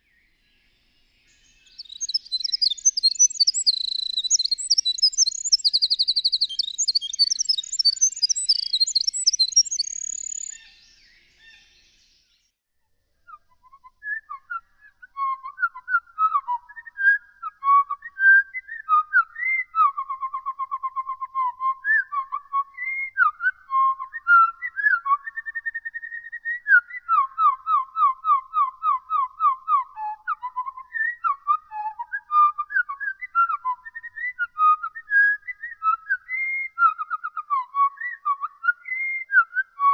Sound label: winter wren slowed